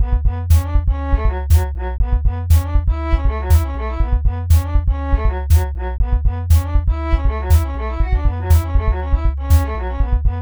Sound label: main music loop, Music; revival-survival